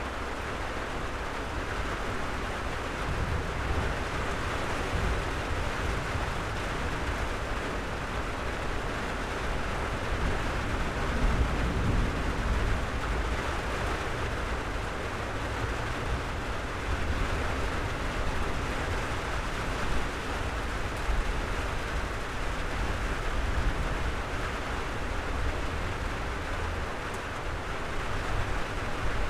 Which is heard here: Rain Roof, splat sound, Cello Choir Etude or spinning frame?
Rain Roof